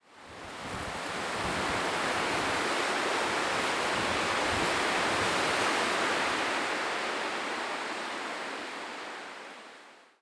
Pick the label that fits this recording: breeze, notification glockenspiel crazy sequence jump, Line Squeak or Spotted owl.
breeze